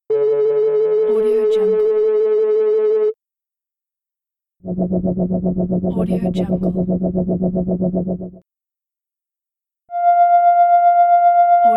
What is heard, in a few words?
frequencies oscillate fx